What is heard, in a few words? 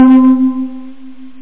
steel drums